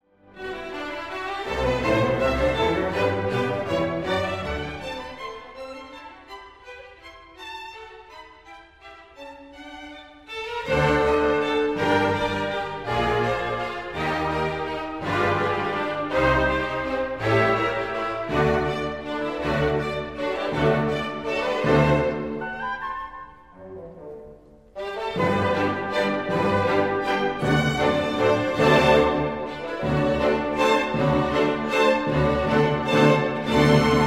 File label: minuet